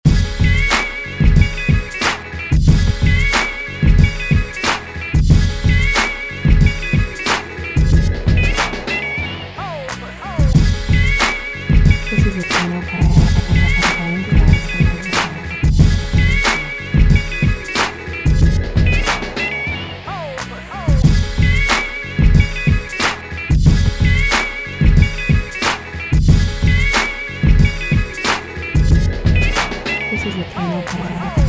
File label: alabama blues